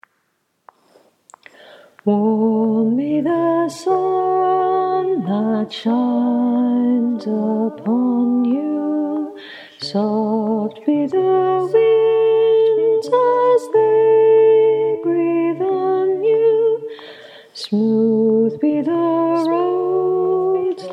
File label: Tune; Keep You in Peace